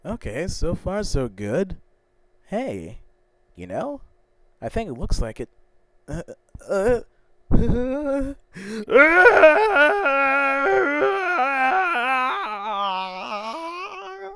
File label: RRAAAAUUUUUAAAAUUUUUGHHH